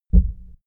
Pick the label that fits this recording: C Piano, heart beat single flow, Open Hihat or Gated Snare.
heart beat single flow